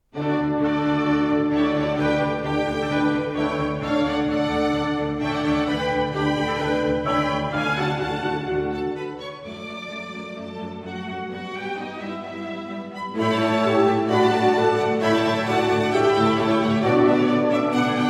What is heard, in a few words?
Flute Concerto, Allegro; Mozart